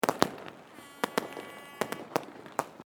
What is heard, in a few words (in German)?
Silvester Knaller